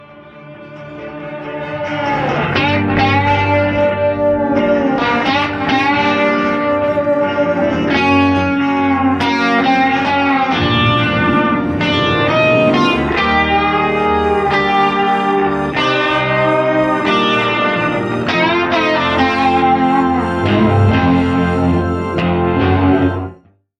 (Parts VI-IX) second guitar solo extraction